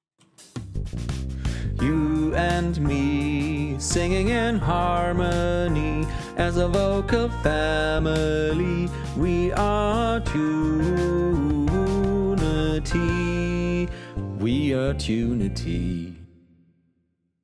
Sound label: alt unpitched